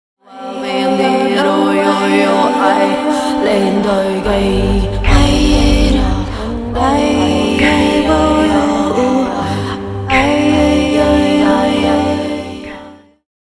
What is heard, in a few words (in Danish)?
synger